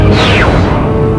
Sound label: Romulan Ship Fire; Trek